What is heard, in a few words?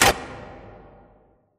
Giant Pillar Pods Start; Titanfall 2; Boomtown